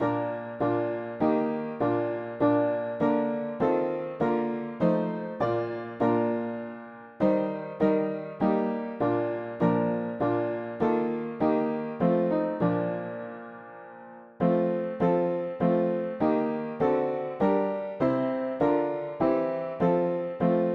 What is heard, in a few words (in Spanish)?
mono piano; hymns; Himnario pequeno